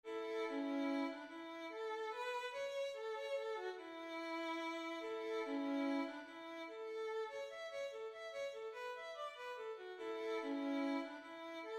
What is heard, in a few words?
Waltz Melody; Virginia Waltz